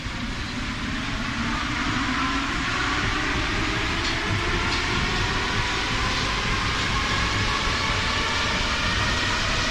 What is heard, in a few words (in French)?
acceleration, Metro